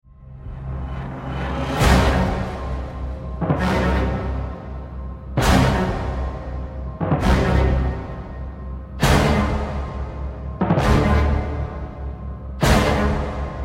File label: Brass Action; Star Trek Deep Space Nine The Fallen